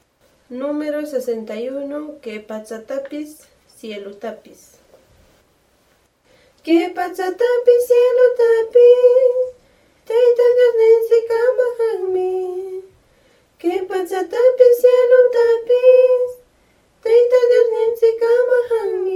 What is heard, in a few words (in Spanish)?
cancion